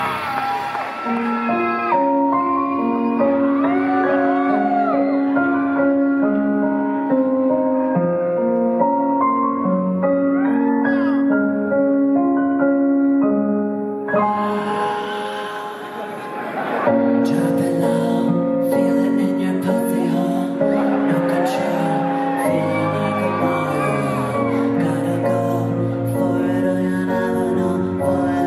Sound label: ACOUSTIC LIVE